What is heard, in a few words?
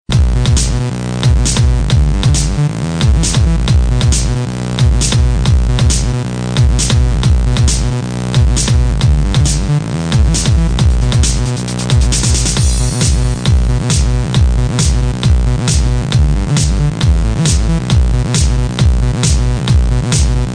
popcorn